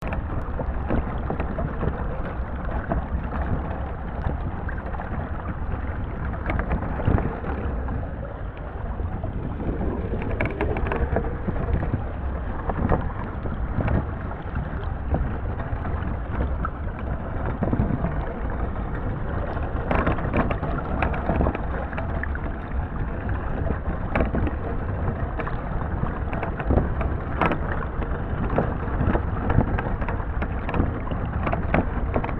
rattle, monaural recording, YBCA Fountain, San Francisco CA; Underwater